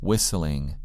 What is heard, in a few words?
whistling